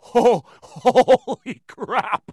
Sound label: laugh evil; Borderlands 2; Jack